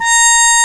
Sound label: A#5, BRASS